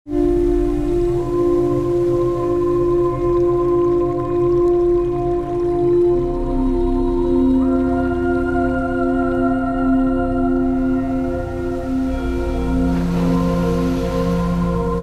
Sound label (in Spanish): Medita Aguas